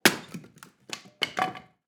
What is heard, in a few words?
wood chop axe hit; nature